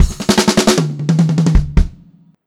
FILL, Pop-Rock Drumloops; USB Soundscan vol.37; AKAI; 90 s Sample CDs; 152 SPEED

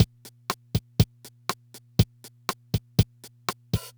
Loop, dd-5

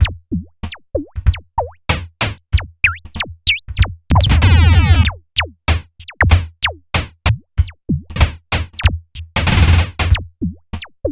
TECHNO, Funky Xtreams; INIS

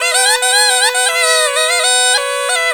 PUNGI

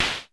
NOIZ, HHAT, Dual Percussion Synthesiser; Klone

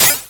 Simmons SDS8

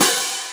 CHOKED PLETES, ORCH PERC; Greytsounds VOL-1; ORCH PERC 2

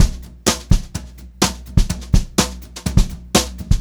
Brushed Drum Loops; USB Soundscan vol.39; AKAI

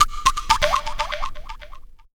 Pops; Jungle Warfare 1&2

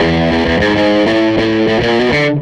SG Guitar Loop; Sonic Foundry (Sony Creative Software); Six-String Orchestra

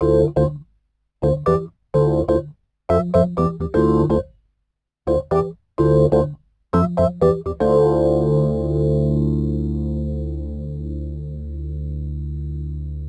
Rhodes Attacks; Ueberschall

